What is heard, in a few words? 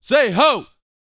VOCAL F; ILIO